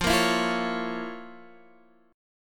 F Major 7th Suspended 4th Sharp 5th F, Guitar Open D